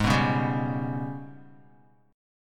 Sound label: G sharp Minor Major 7th Double Flat 5th, Guitar; G#mM7bb5; strummed; 3,2,1,0,1,x; 4F,4A,5C,5G,5C,6E; JGuitar